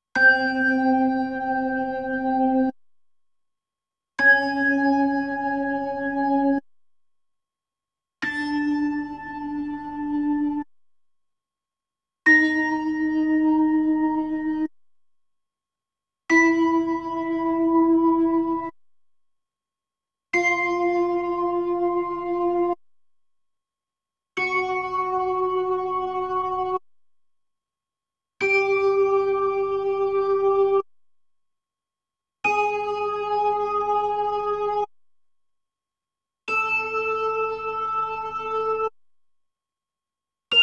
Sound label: B3 Organ; Masterbits CLIMAX 8